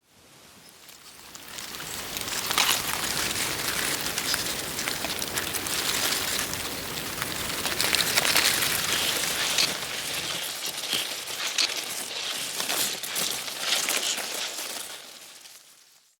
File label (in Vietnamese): Am thanh con gio thoi la cay roi lac dac